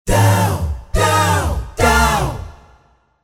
Dow Falls, Jazz Group; Jazz Group Multisamples

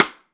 STICK, Drum 1 Dry; East Collexion

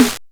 Crushed Perc, bitcrushed; musicradar

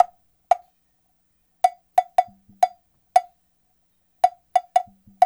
COWBEL

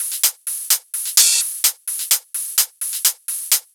Loops 128BPM, Drumloops

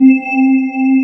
CHORIA PAD; East West; Global Sounds

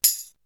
Tambourine; ZERO G - Datafile DISC 3

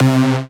SYN, Synths; M8; Electronisounds

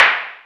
NOIS, Techno Trance; E-MU Formula 4000 Series Vol. 2

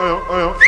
LO REZ WHIST, VOCAL

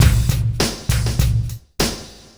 Club Tip, R&B Drums

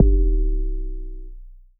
Kicks, Elektron MachineDrum SPS1 MKII

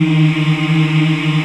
African Mm m; Roland LCDP11 Africa VOL-1

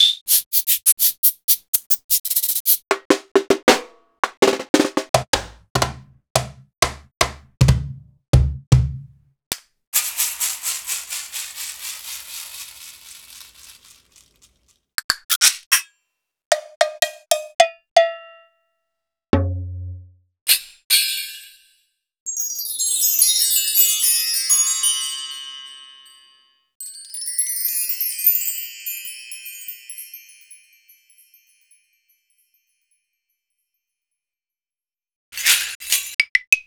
Roland BK-5 Percussion

